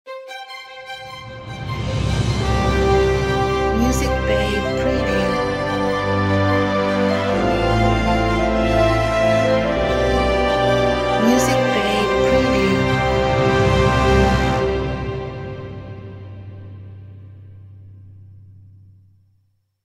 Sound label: Triumphant Fanfare